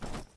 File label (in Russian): bistrij chyotkij korotkij zvuk shitya na shvejnoj mashinke